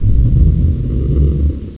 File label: groan